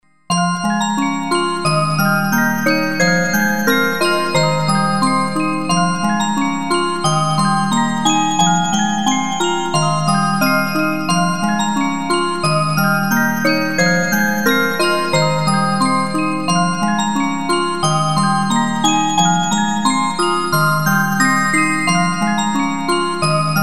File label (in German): Orgel